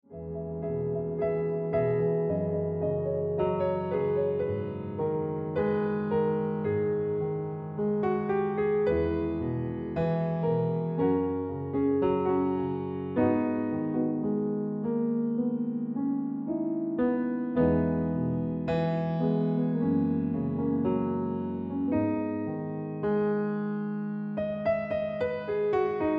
Ringtone

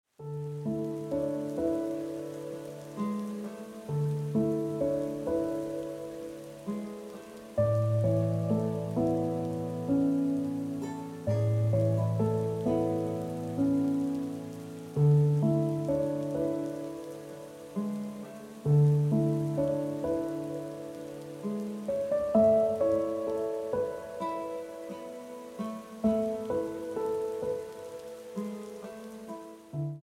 Ringtone

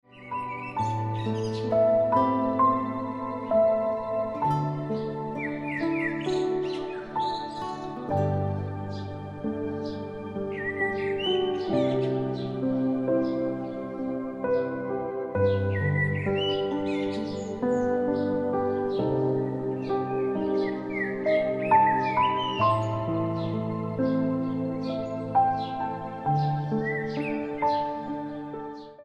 Piano Music

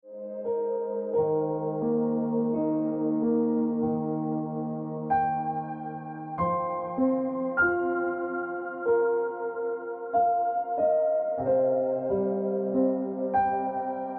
Keep It Colorful Ringtone Piano Music

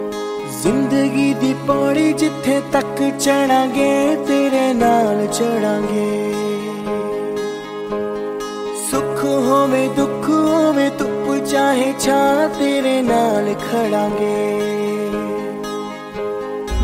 Ringtone

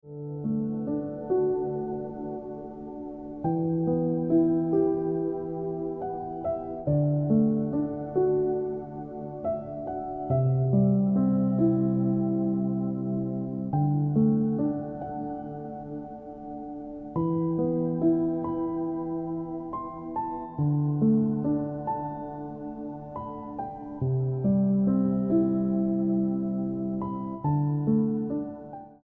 Piano Music

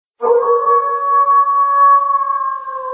Wolf Distant Howl Ringtone

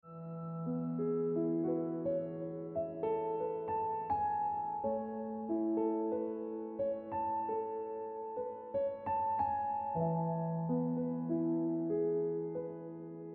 Ringtone

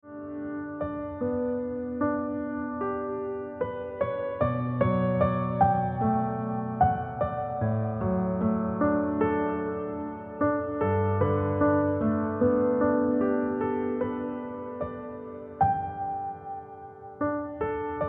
Ringtone Piano Music

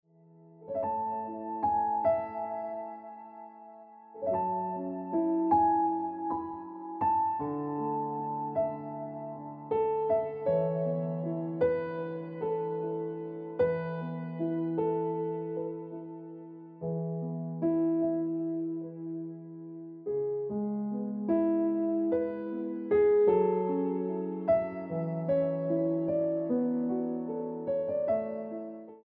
Happiness Of Waiting Ringtone Piano Music

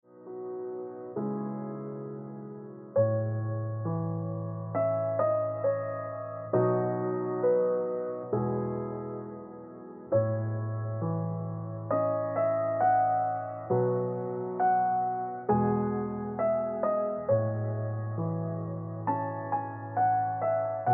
Ringtone Piano Music

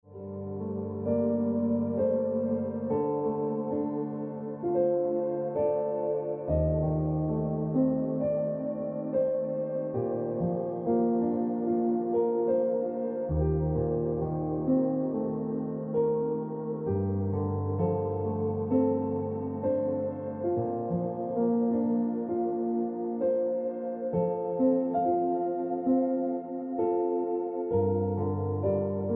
Ringtone Piano Music

